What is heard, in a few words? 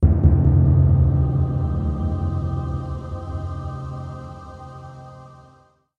Angelic Drum Roll